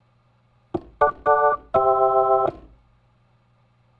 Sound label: Organ, 70s Analog Funk